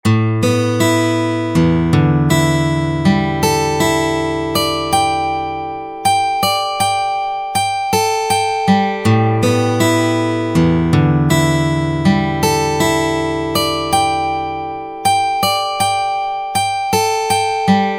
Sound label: Six String Steel Guitar